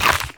bite; Fate The Traitor Soul

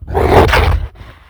attack, abomination; Fate The Traitor Soul; MONSTERS

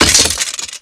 weapon rack; Fate Undiscovered Realms; ITEMS

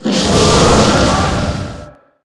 fire beam, basilisk; Fate The Cursed King; MONSTERS

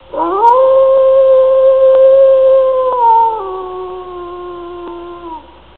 howl, wolf; Fate The Cursed King; MONSTERS

